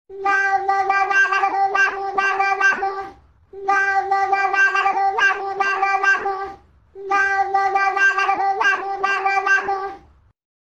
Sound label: mow tuned; YTPMV; FL Studio